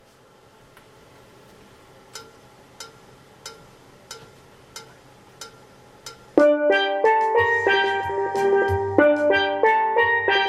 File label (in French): tenor 1 theme + accompagnement; BELLA CAO